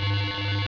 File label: SRM Growl; F/A-18 Hornet 2.0